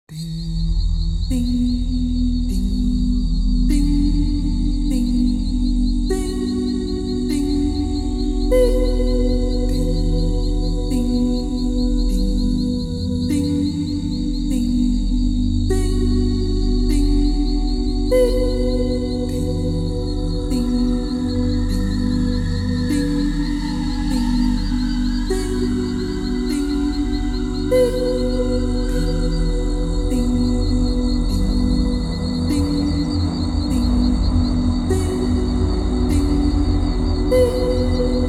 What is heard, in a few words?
string